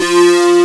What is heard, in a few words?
AN SYN, SYN & LEAD; SAMPLE WAVE MIXING 2